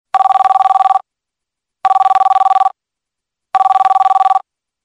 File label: Ring, ringtones; Oppo Find X903